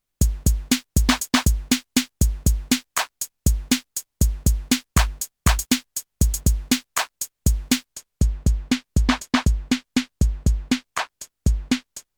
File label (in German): Filtertypen Normal LoFi, synthesis; Mega Synthesis